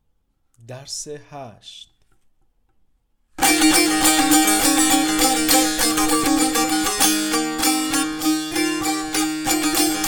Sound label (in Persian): مشق تنبور